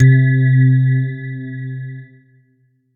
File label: ensoniq sq 2 electric piano c4